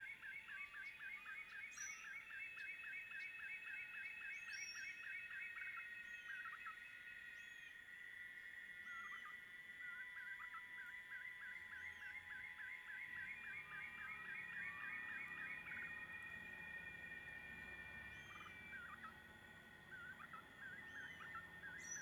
Ambiencia fim de tarde passaros, grilos, cigarras e motor longe; ambiencia rural

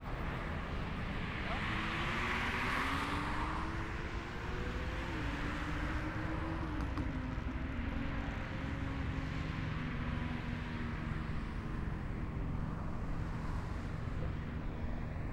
Ambiente avenida com motos helicoptero transito intenso; Colecao Sonora do Cerrado

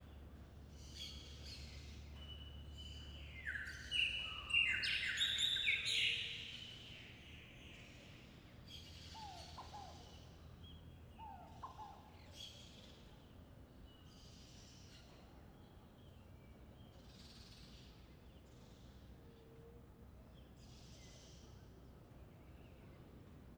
Passaro preto com outros passaros em ambiente reverberante com transito; aves; longe; Coleção Sonora do Cerrado

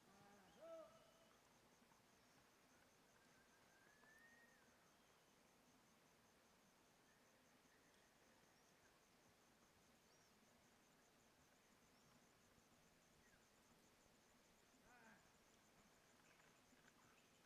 Ambiente campo com grilos tranquilos e vozes longe dia; ambiencia rural

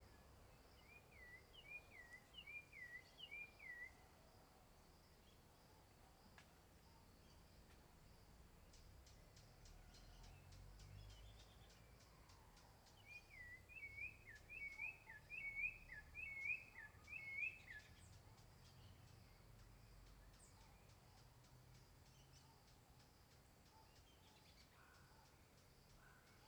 Passaros diferentes longe e perto no parque olhos dagua com transito longe; aves

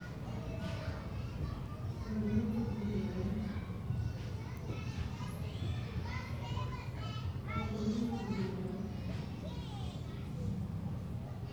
Criancas no parquinho escola ao meio dia passaros, mulher falando no micro; multidao

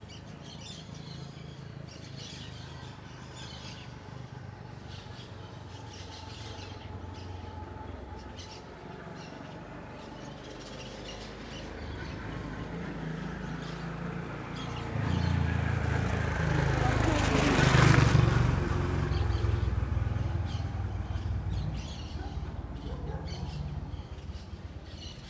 Transito rua de manha passaros vassoura varrendo e moto passando; Colecao Sonora do Cerrado

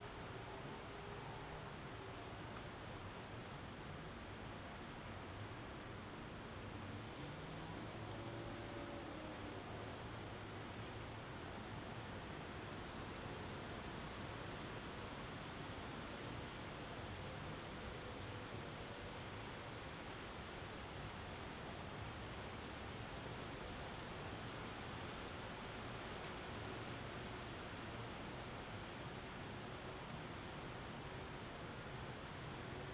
Ventando nas arvores poucas rajadas na mata, cortador de grama longe; goias

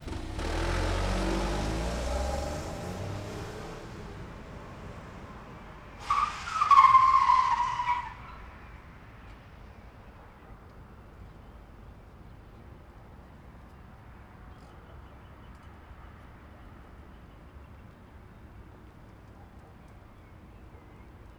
Carro acelera e depois freia cantando pneu; maquinas; colecao sonora do cerrado

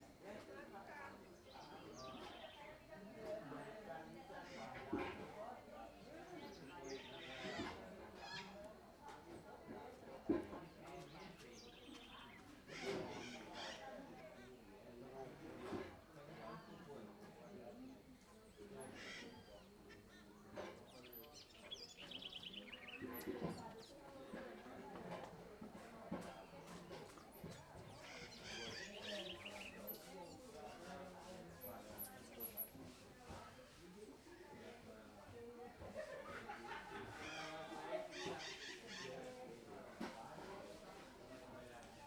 Vozerio na casa vizinha, utensilios batidas fortes, araras; multidao; alto paraiso; passos

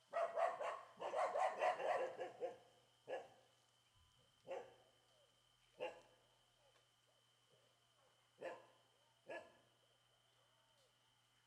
Cachorros Latindo forte em rua pouco movimentada; Comunidade do Moinho; chapada

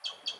Passaro com Som de Laser na Beira do Rio; aves

